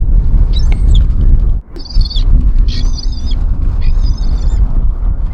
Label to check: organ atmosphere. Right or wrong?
Wrong